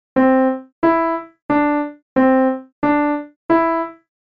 Melody

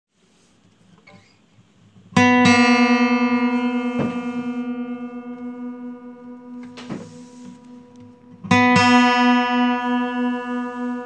B string; being tuned

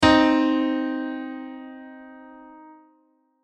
c minor third harmonic

